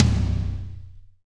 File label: DRY TOM, TRASHY KIT; Spectrasonics; Burning Grooves CD2